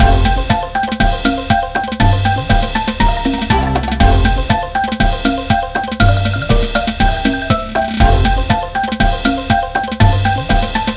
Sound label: Spring (With Drums); Pikmin Adventure